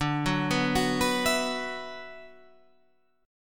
D 6th Add 9th D, Guitar, Half Step Down

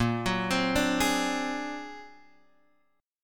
A sharp Augmented 9th A sharp Guitar Open G

